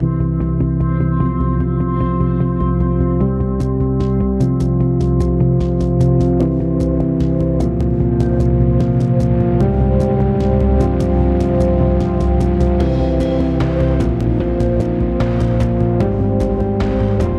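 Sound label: Music Industrial Post Race 75bpm; Forza Motorsport 6 Apex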